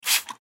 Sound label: Spray Bottle Pull, Spray Bottle Pull Handle Squirt Water Single; Spraying; Household; Blastwave